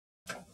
gearclick, train; OpenBVE

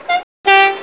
Klaxon, Train; OpenBVE

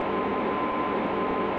motor, Train; OpenBVE

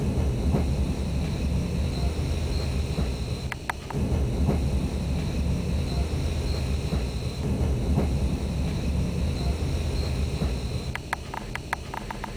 air zero; RBDe560

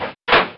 Reverser, Train; OpenBVE

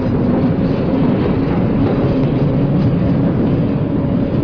Run, Train; OpenBVE

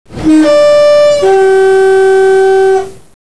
klaxon, Class 47; OpenBVE; Train

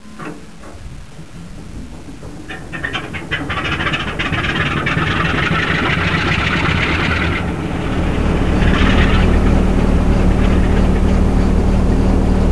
fire up, Class 47; OpenBVE; Train

